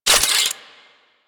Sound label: Rocket Launcher Charge; Warframe; UT Weapons